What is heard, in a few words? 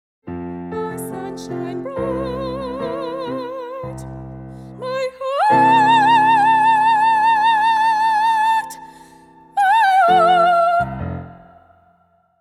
Soprano